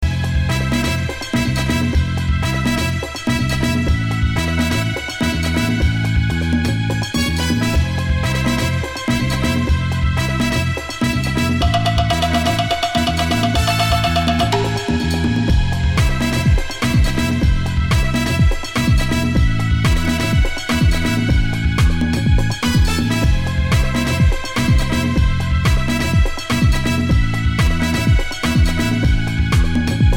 (Drum dub version)

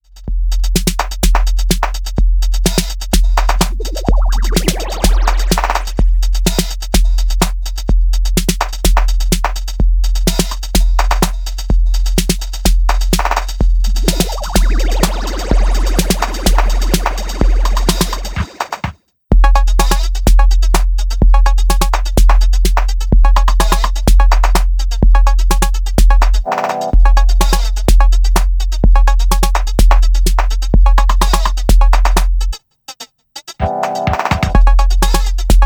Open Mic Beat

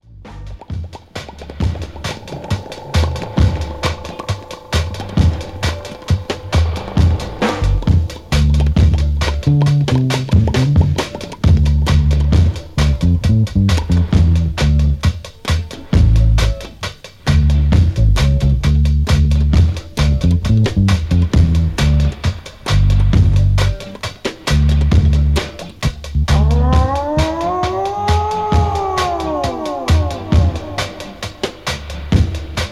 Drum Rock

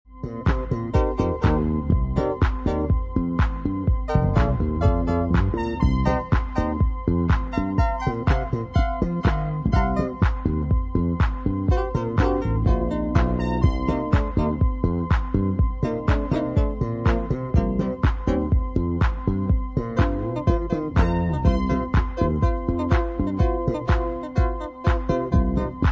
bassline; House

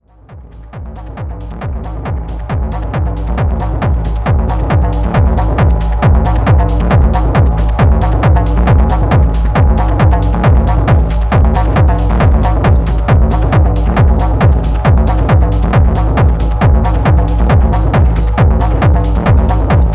Scream

